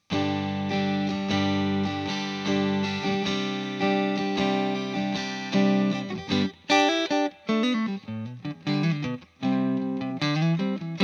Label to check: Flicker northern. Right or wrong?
Wrong